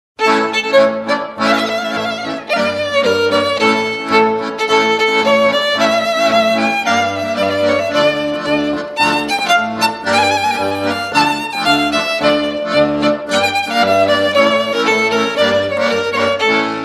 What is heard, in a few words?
Accordion; French Accordion Player, German Accordion Music; French Music, Party Music, Solo Accordion